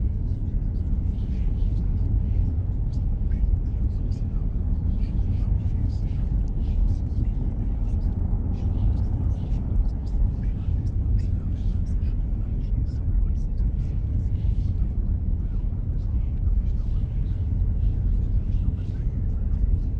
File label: ship idle; ships; beholder; Stellaris; paragon